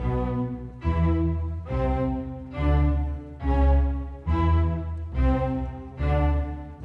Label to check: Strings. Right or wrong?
Right